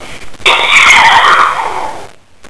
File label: infect, zombie blood